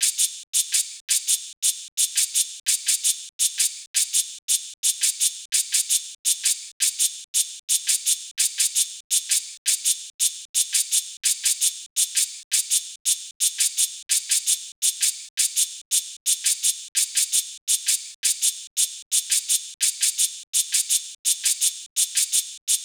084 Hip Hop Shaker, Percussion; Digidesign; Pro Tools; Pro Tools Essential Loops

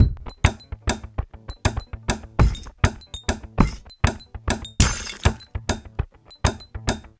Pop Found Perc Combo; Pop Rock; Pro Tools Essential Demos; Digidesign; Essential Loop Demos; Demo Session - Pop Rock

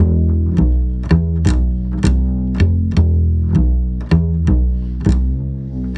080 NuJazz Bass E maj; Pro Tools Essential Loops; Digidesign

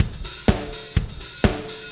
125 Disco Drums, Fast Tempo; Pop Rock; Digidesign; Pro Tools; Pro Tools Essential Loops

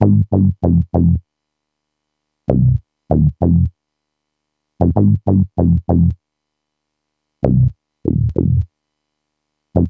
W-Coast HipHop Synthbass Fmin; Digidesign; Pro Tools Essential Loops

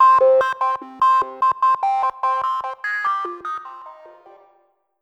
R&B Lead Cm; R&B Funk Soul; Digidesign; Pro Tools; Pro Tools Essential Demos; Essential Loop Demos; Demo Session

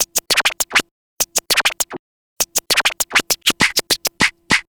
Vinyl Scratch Clap; Digidesign; Pro Tools; Pro Tools Essential Loops; Dance DJ

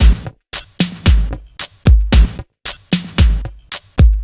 Dance Beat

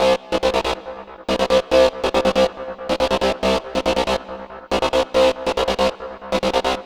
140 House Arp Cmin; Pro Tools Essential Loops; Dance DJ; Synth; Arps

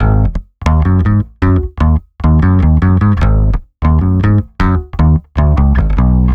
151 Garage Bass Abmaj; Dance DJ; Digidesign; Pro Tools; Pro Tools Essential Loops

